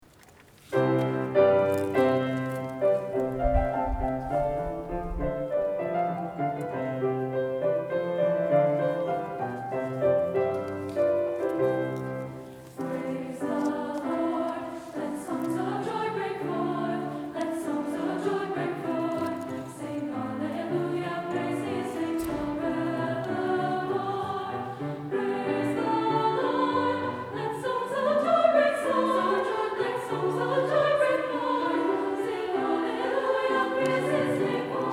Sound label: Concert Choir; Handel